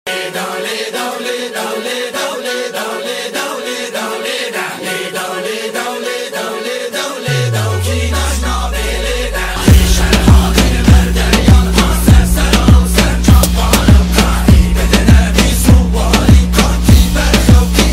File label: kurdish trap remix; kurtceremix; kurdishmusic